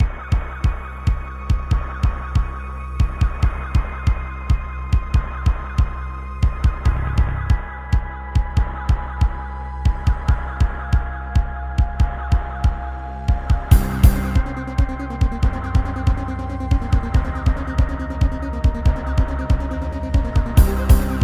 Yamaha SHS-500; Doom (Sigil)

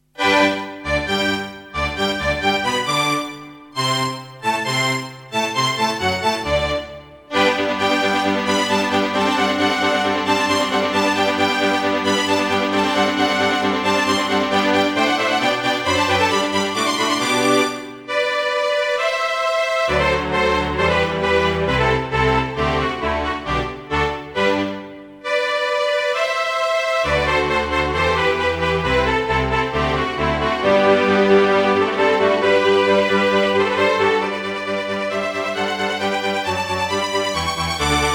EMU SoundEngine; classical; Mozart; Eine Kleine Nachtmusik partial

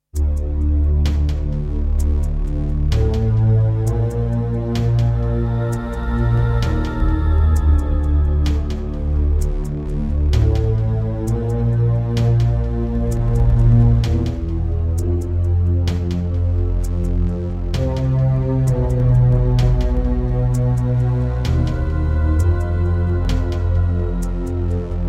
Yamaha TG100; Descent II

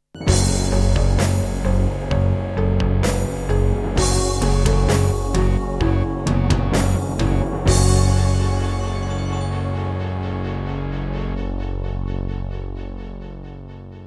Hammond GM-1000; Doom (Sigil); Introduction

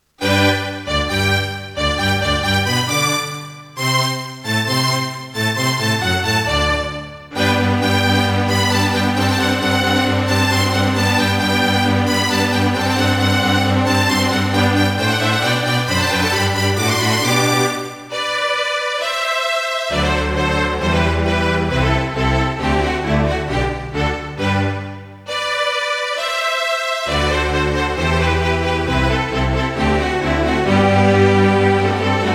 Roland CM-500; classical; Mozart; Eine Kleine Nachtmusik partial

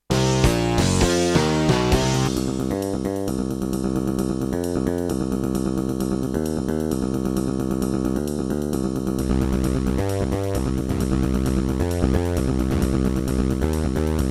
Korg Audio Gallery AG-10; sigil doom episode 5

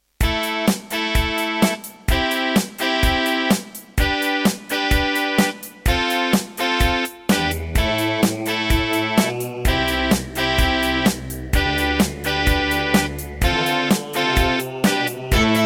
NEC XR385 (DB60XG Clone) GSMODE; raptor call of the shadows

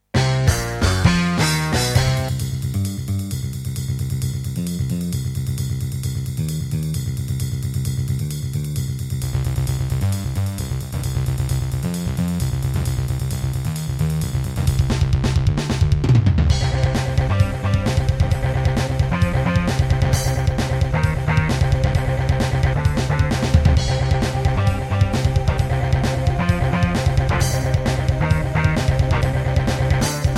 Casio CTK-2100; sigil doom episode 5

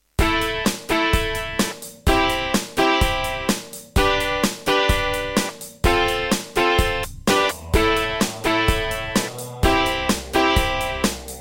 Roland SonicCell GM2TEMPLATE; raptor call of the shadows

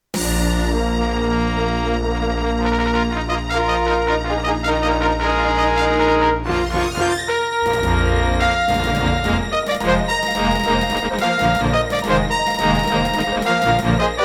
Intro, Roland CM-500; Dark Forces

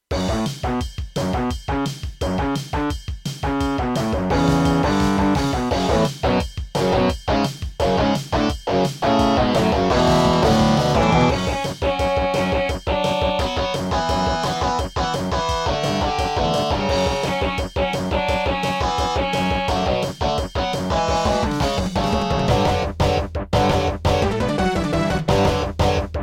Intro, Gravis UltraSound Extreme; Duke Nukem 3D

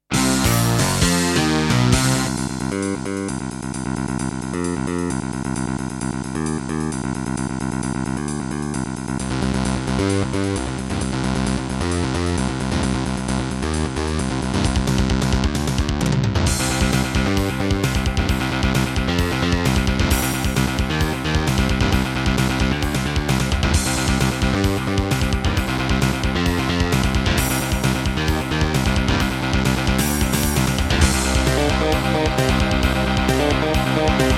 Terratec WaveSystem SCW001-2; sigil doom episode 5

